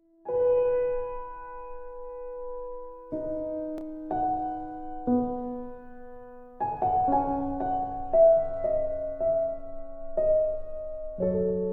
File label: Peaceful Piano